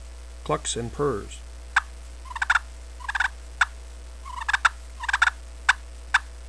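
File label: glass clucks purrs, turkey call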